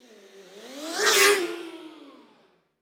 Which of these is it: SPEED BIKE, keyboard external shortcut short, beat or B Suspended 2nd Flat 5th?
SPEED BIKE